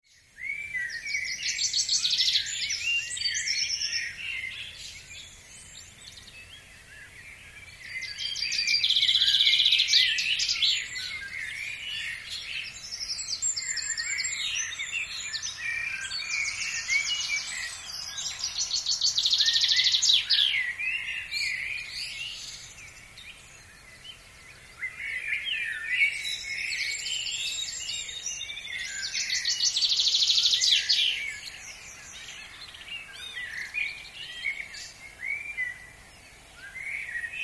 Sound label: Dawn Chorus, Morning summer birds; Animals; Tom Hutchings